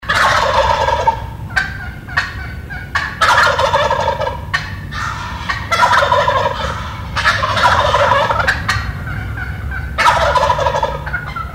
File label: gobble turkey sound